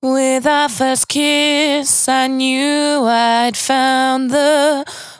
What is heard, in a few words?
92bpm Bb, Velvet Vocal; Zero-G